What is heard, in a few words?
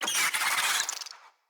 Infested Ashen Vocal Attack Death